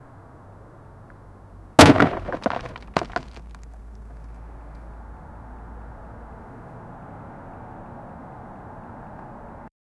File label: vehicle